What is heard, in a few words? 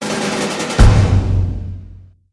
vote failure